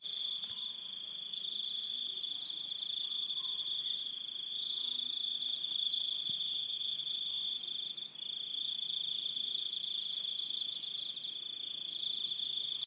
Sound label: Jungle